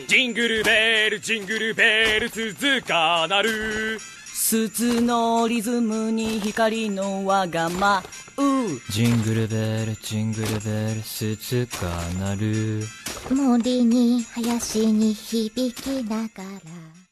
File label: Jingle; Haikyuu